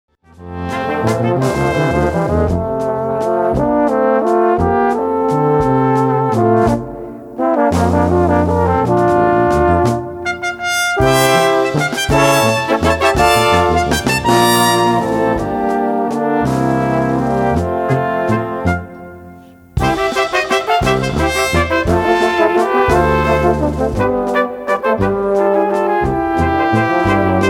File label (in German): Walzer